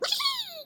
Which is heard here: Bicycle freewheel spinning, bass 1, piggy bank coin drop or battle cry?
battle cry